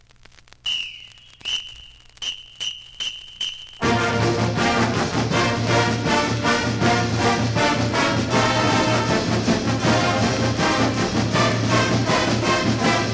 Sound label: High School Fight Song; Westland